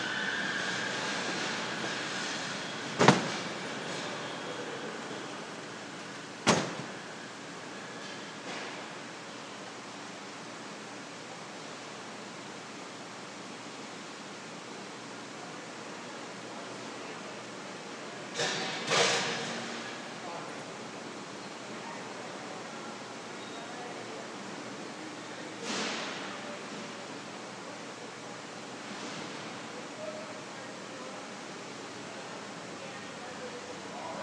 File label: work noises